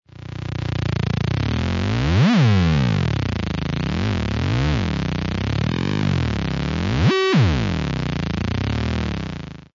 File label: Osc 2